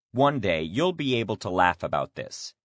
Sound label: laugh